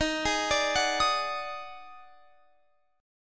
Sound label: Eb Minor Major 7th Double Flat 5th, Guitar, Open F; EbmM7bb5; arpeggiated